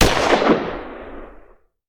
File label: Hand Gun; Sound Ideas; Guns